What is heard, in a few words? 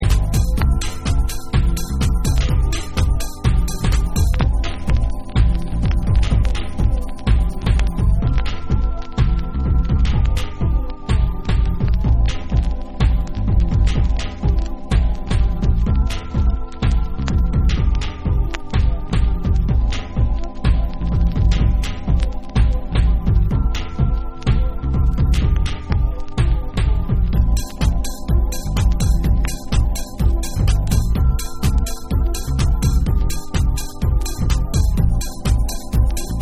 Dub